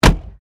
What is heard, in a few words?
HELICOPTER 1990 SIKORSKY UH60 BLACKHAWK HINGE DOOR CLOSE; Helicopters; Vehicles; Aircraft Collection